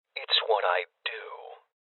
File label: Sniper Shot